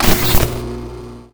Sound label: Bubble Shield; Risk of Rain